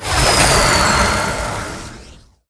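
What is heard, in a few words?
cast skill ice blast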